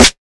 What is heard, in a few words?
reverb snare c sharp key; snare drum samples